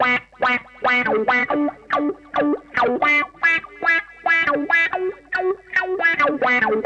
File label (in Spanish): Guitarras